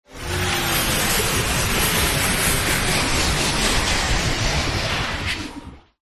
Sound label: Sci-Fi Power Down; Ryan Van Eerde